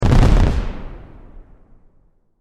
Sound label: Explosion Fireworks Short Burst; Fourth of July